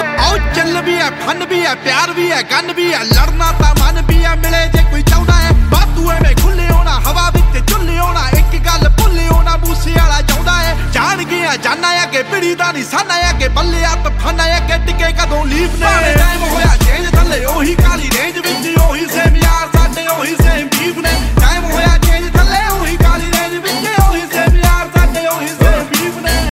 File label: punjabi ringtone